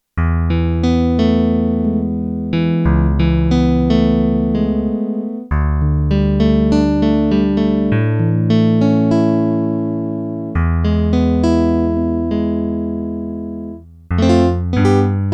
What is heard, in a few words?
Korg DW-8000 GUITAR, Factory Patches